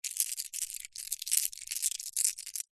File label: foley gemstones several small opal amethyst jade handling movement in hand